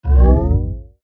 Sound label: Ascend Low Dark Power Up; Blastwave